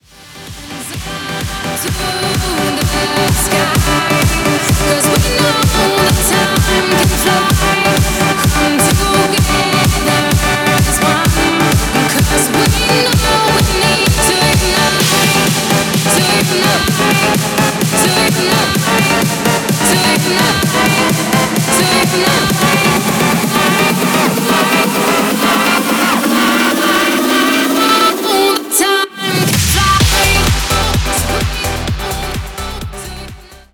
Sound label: EDM build up; ビルドアップ; ボーカルを抜くとこんな感じに